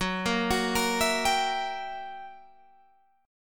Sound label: F sharp 7th Flat 9th Guitar Open G, chord